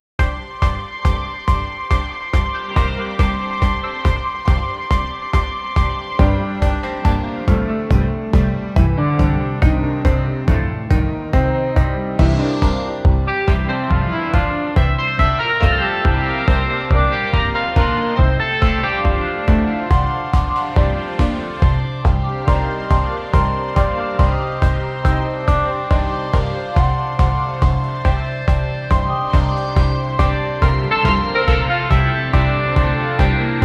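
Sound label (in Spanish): música, background music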